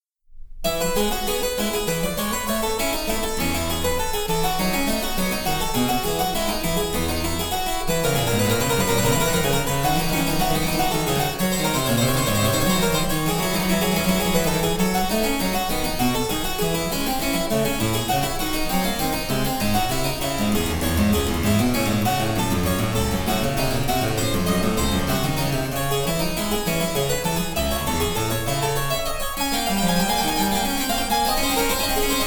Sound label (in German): Cembalo; Mark Lindley WTC 1